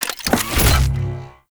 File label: Arch Rocket Crossbow Equip; Warframe; Archwing